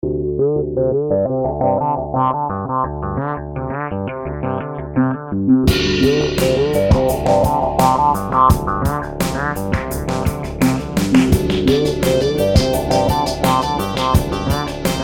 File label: step filter